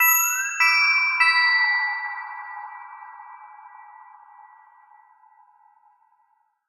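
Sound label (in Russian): космический звук уведомления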